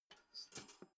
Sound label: toaster pull down